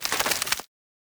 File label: Monster; Zenless Zone Zero